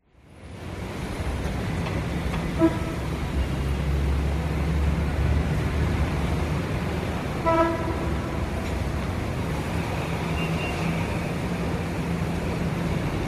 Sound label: Street Traffic; SOUND EFFECTS1